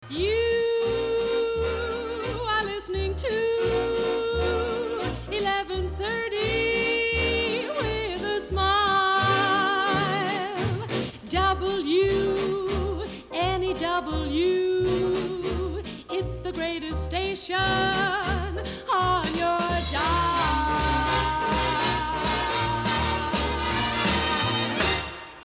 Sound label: jingle; wnew